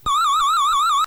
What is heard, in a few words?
sonic screwdriver; flipper